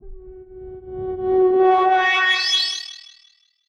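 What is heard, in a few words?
alarm siren, filter processed, 170bpm; musicradar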